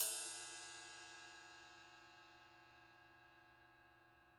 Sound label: Ride Ping Bow